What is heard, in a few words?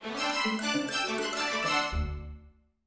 Fanfare Finding of a Treasure